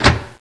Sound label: switch; sven; caged